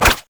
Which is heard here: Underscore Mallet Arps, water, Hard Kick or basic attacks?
basic attacks